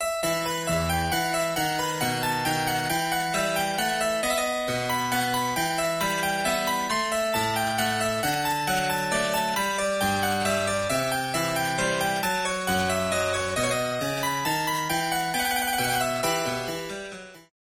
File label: courante in e minor